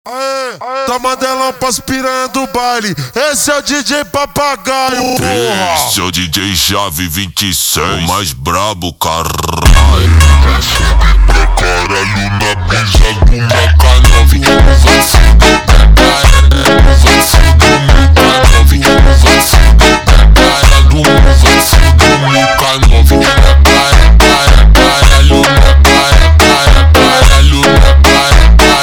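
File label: slowed, phonk music; funk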